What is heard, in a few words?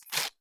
bandage open; garrysmod; item ifak